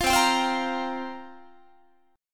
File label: C 7th Suspended 4th, Guitar, chord